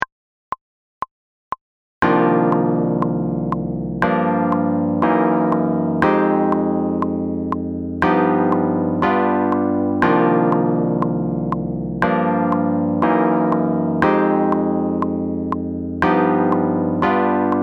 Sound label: Soul R B chords